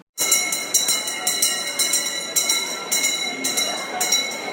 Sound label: bell for the globe theatre audience to take their seats